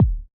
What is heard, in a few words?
Hiphop kick; Reverb+EQ+Comp+Limit